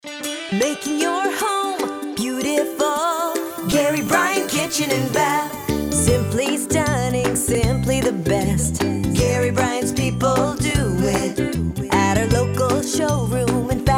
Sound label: Kitchen Bath Full Sing, home builder jingles; Gary Bryan